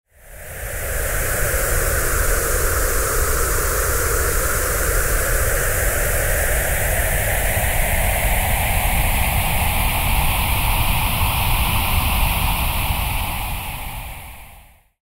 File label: wavy pink noise